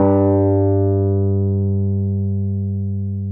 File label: Wurlitz EP G1; Sonic Reality - Vintage Timetraveler